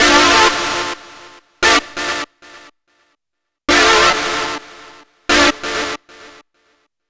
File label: Chord Stabs, EDM; Sonic Foundry (Sony Creative Software); Afterhours EDM